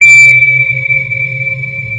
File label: sonar Ping Water Medium; Barotrauma; Real Sonar